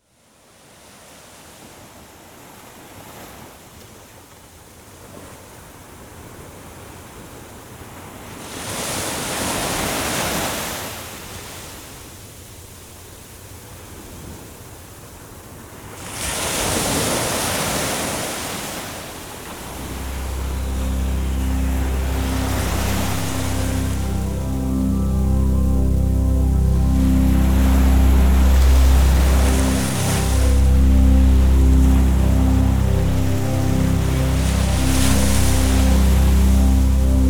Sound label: Surrender and Ocean, Relaxing Music; relaxing music of Surrender with the Ocean; Deep Relaxation